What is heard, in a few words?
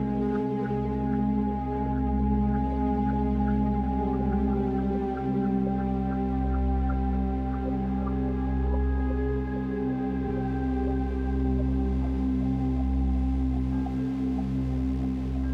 MUS loop